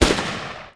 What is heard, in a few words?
ak 47 ext shot